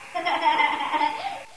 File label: baby laugh; svencoop; saker